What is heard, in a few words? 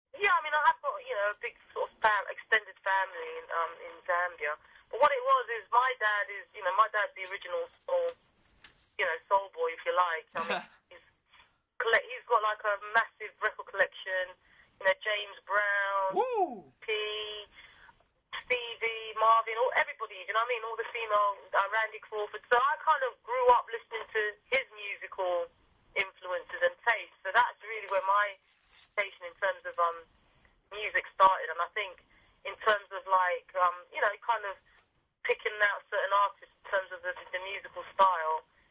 Musical Background